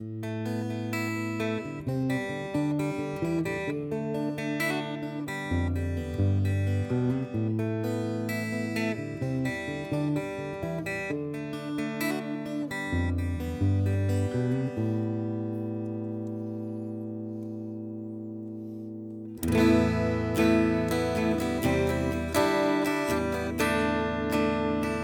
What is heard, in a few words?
Harley Benton CST-24 clean